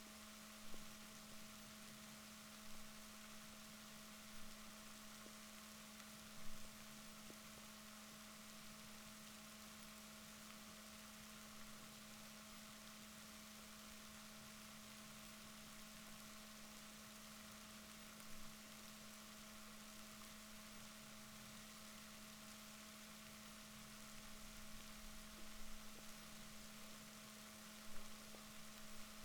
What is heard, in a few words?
rorquals positif, Balaenoptera Physalus Chile